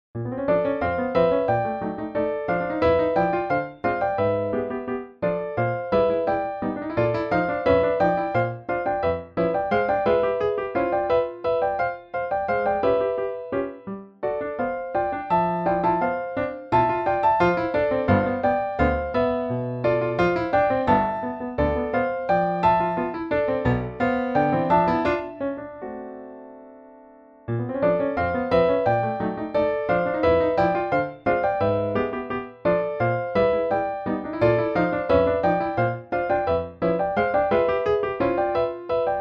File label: polka, piano duet; recital pieces